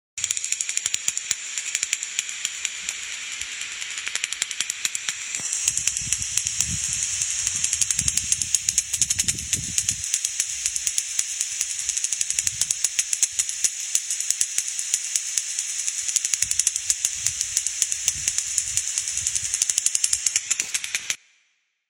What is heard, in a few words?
Bat sound; a simple wildflower garden with a surprising success